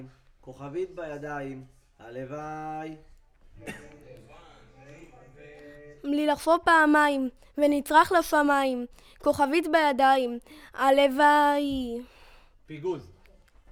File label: VOC